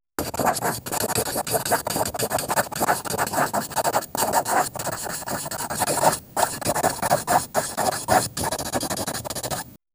the crisp realis, drawing

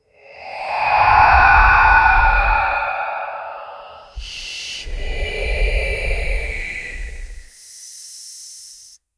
portal opens with dark hu

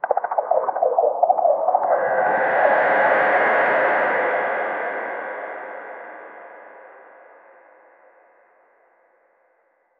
ui motion

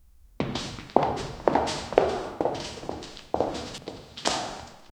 rapid running footsteps, hallway

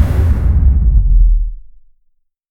bass going down and explo

